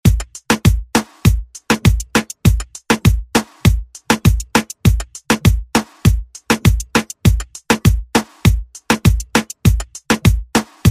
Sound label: Moombahton Hype Redrum